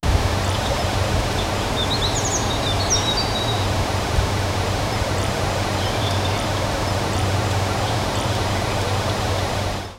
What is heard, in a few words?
forest ambience gentle wi; market chatter